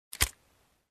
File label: Weapon pickup sound